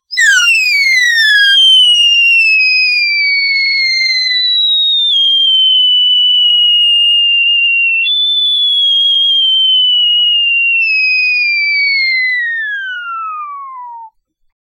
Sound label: magical clear cartoon whi; enchanting